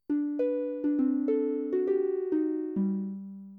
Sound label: harp